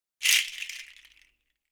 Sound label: shaker (kinomi)